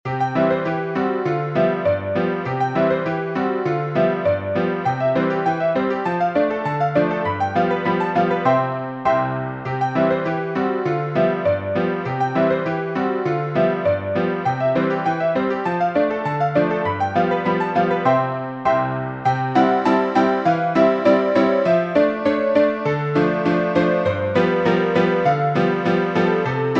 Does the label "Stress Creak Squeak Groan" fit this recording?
No